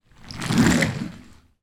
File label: toy vehicle pass on carpet